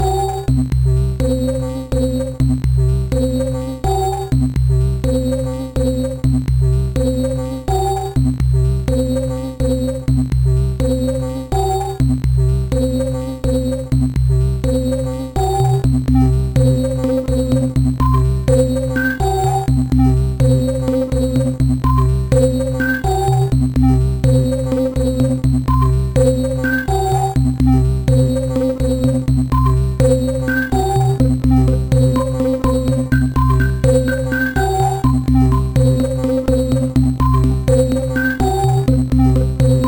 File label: non pulse pushes, chiptune